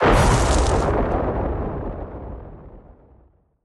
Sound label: freespins win small; Dragons Myth